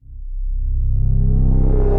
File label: Venus Bike, Ambient & Evolving; Ableton; Glitch and Wash